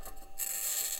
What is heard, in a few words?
mic, mars2020 edlcam